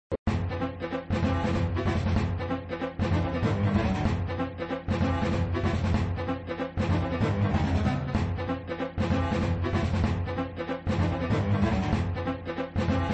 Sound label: Music 2 Ball multi ball (looping), flipper; Verschiedene Musik(schleifen)